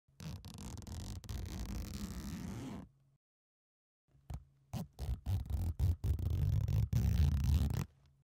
Pencil case

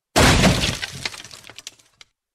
breaking wood; شکستن چوب

